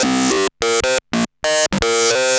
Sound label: Arp E Line, ARP Odyssey; Lines; synth; musicradar-synth-samples